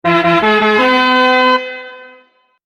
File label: victory